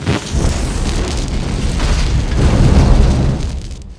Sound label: Firey Rage; lego ninjago rise of the snakes